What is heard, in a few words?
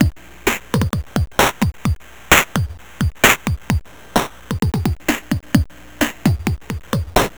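Messup Loop, beats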